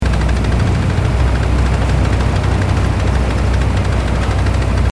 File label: Loop Mechanical Engine Tractor Drive; Sunflower Land